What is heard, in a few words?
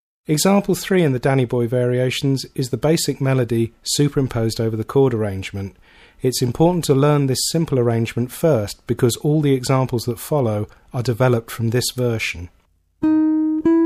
Basic Melody Version, Guitar